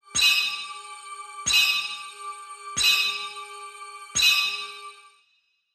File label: gong